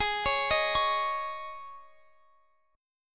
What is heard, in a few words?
Ab Suspended 4th, Guitar Open F; arpeggiated; Absus4